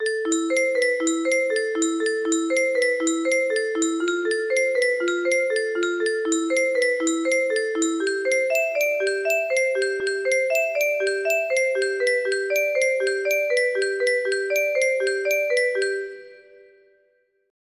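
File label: music box melody; kikkerland 15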